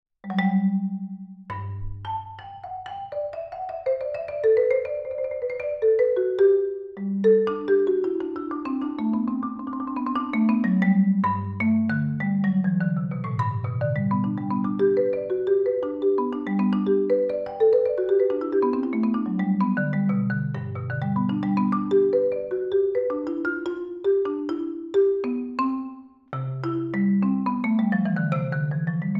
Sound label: marimba transcription